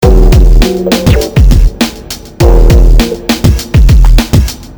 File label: Loop